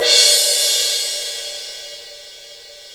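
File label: cymbal crash; Best Service Gigapack 2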